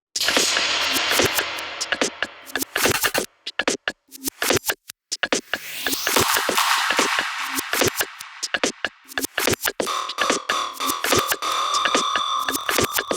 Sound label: Loop, PsyTrance